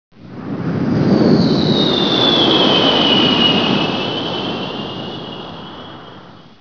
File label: fly by; Presentation Partner